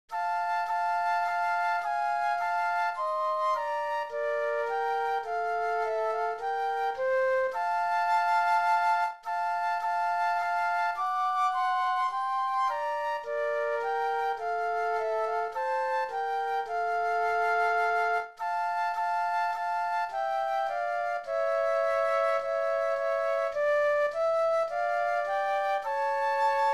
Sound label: Flute Duet; christmas